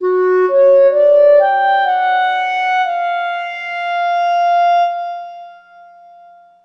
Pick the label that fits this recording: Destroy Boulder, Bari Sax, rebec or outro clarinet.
Bari Sax